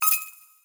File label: item put mini